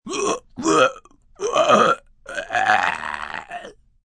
Vomit Short, Vomitting, male, short, gagging, gasping; Vomiting Sound Effects; (human voice)